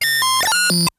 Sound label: tone clusters bursts of high pitch beeps ui; prosoundfx